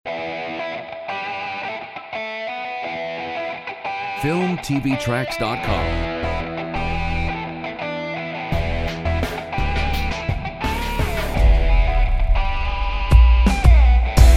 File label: Energetic Rock